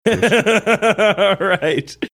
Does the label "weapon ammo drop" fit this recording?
No